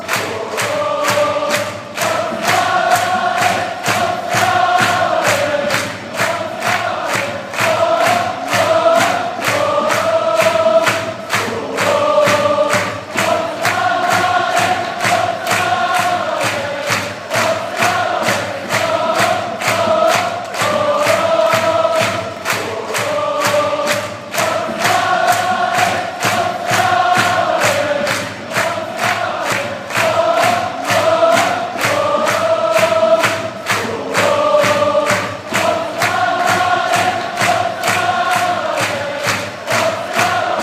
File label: aida, fanchants